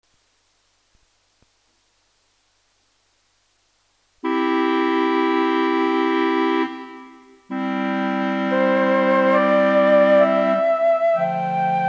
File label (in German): floete; Klanghaufen